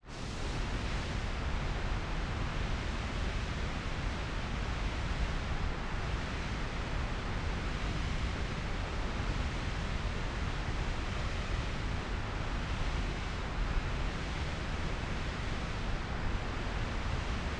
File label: granular synthesis, nature ambient; aulart